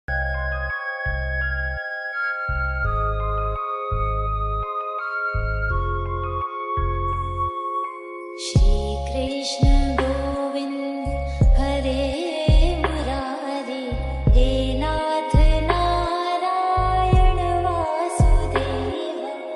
ringtone krishna music bhakti song; shree krishna govind hare murari

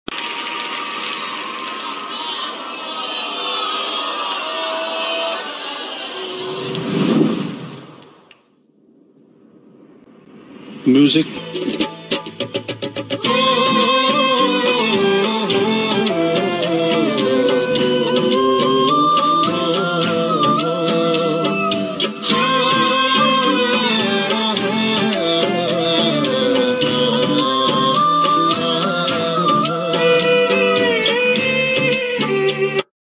oh oh oh ohhh music meme; pankaj tripathi

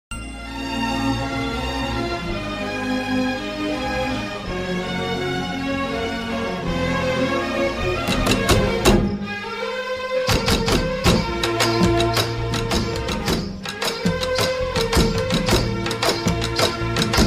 love bgm; aadavaari matalaku arthaale verule

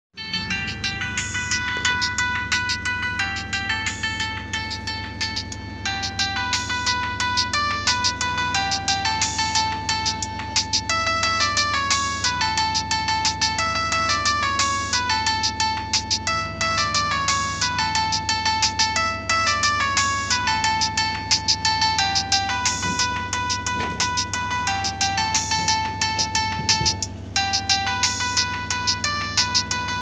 nokia old ringtone

